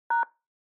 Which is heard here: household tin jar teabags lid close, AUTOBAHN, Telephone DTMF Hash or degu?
Telephone DTMF Hash